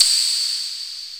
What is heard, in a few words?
CYM TRANCE; E-MU Producer Series Vol. 8 – Platinum Phatt